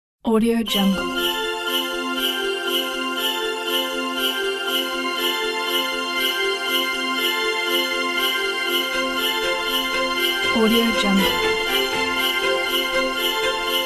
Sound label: magic christmas ident